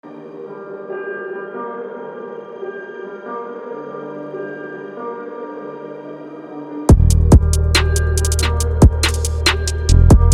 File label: lofi beat